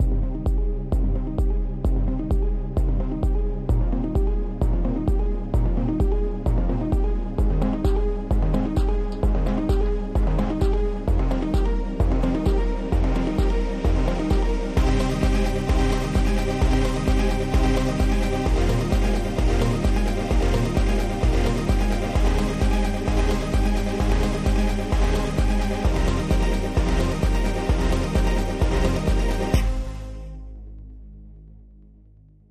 130bpm Alt Percussion

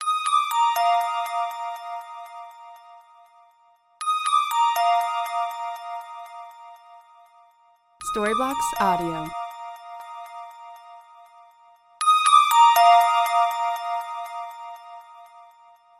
Beach Day Bells 120 C

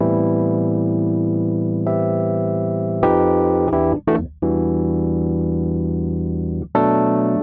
c funky house electric piano loop part b 129 bpm

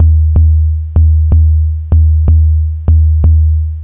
Ambient House Low Tom 125BPM

